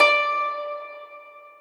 harp

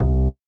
bass C3

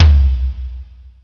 Tom; osu

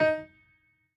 piano hi

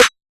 trap smacc

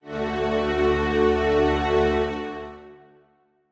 Strings end

